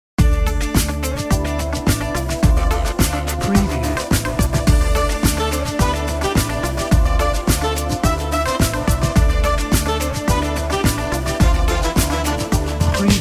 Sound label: Pop and RB